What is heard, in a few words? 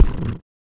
PURR PUTT, Vocal Blues Soul; Spectrasonics; Vocal Planet